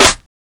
subtle reverb snare one shot c sharp key

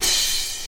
verby open hi hat one shot C key

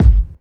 clean kick drum one shot g key

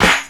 perfect snare sound a sharp key

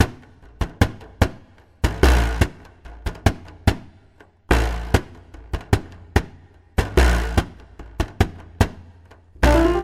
98 bpm 2000s drum groove g key; drum loop

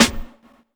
trebly snare drum sample b key

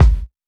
solid kick a key; Duration 0:01; Channels Mono; kick drum samples; single hits one shots

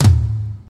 reggae tom sample f sharp key; tom drum samples

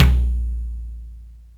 roomy tom drum one shot a sharp key

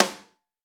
crunchy snare drum D key; snare drum samples

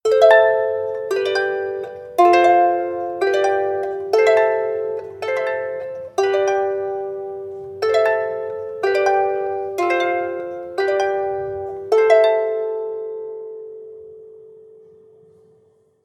celtic harp arpeggio

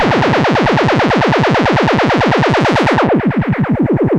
monodelay, synth; loop

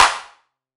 hand clap sample b key; single hits one shots

